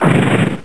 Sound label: DAMAGE; WarBirds v1.10r0